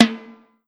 Perc Timbal

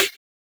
can

